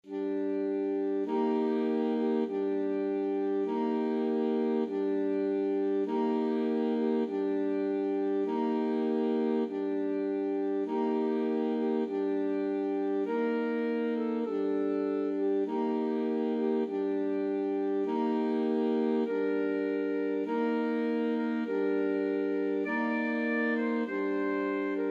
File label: chopin funeral march, alto sax quartet